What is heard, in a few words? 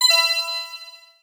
coin to gem; swear jar; conversione